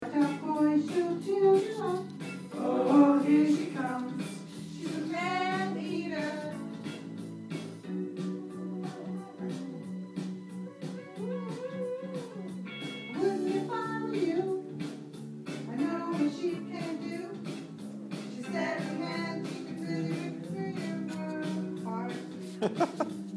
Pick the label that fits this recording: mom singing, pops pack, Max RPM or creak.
mom singing